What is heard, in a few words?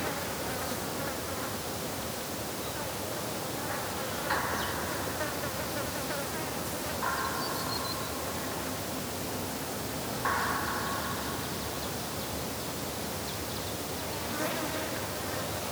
desert wind